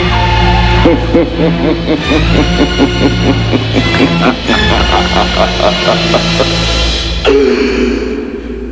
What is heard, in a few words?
Dracula Laugh with Background music